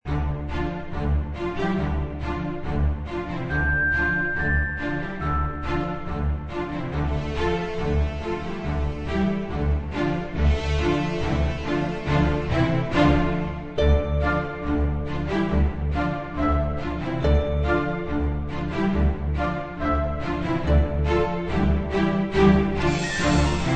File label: Overworld Serious Sidequest; Darkend